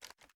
sm beta inspect; gmod

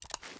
sm uzulu reload xmag fast shake; gmod; iw8

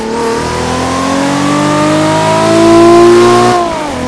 second, vehicles; gmod; tdmcars; supra

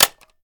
ar reload mag in; weapons; gmod; viper; scharlie

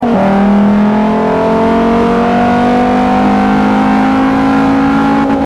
third, vehicles

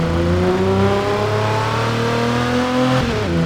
second, vehicles; chevrolet impala

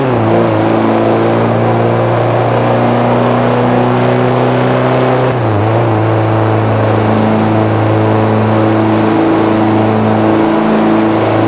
fourth cruise, vehicles; gmod; tdmcars; jee wrangler fnf

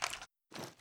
ar xmag reload empty settle, weapons; gmod; viper

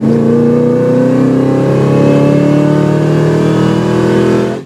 third, vehicles; chev suburban

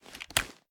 reload end, reloads; gmod

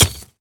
frag physics bounce gravel hard; gmod; viper

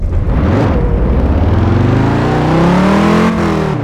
first, vehicles; viper

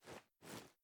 charge reload arm, reloads; gmod

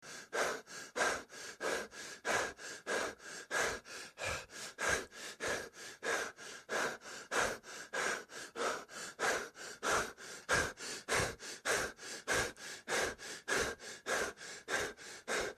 breathing; gmod; perp2.5

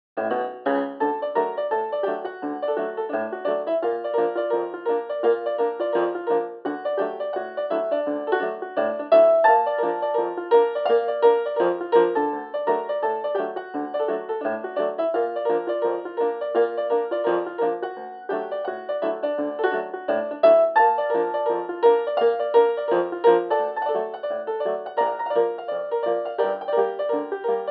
Ragtime; The Outer Worlds OST

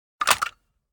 wpn gbl plr inspect settle; viper

